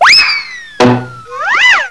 Zing Bonk

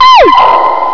SCREAM

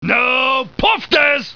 PUFF

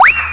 WHISTLE

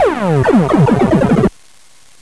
DOP ZAP

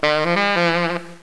SAX

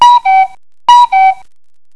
CUCKOO; psion cd vol1

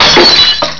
GLASS

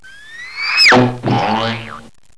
Zing Boing

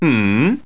HMMMM

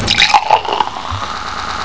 Champagne; psion cd vol1

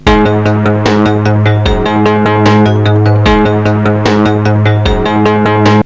Seq Melody; psion cd vol1

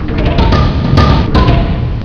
Tapatackpata

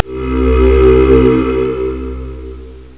TIBET; psion cd vol1

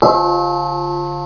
Stoke Of The Bell; psion cd vol1

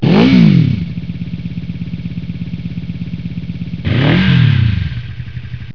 Motor Cycle

